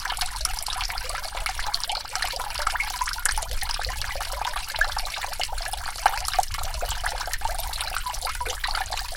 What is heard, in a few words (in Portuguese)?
toque para celular barulho de agua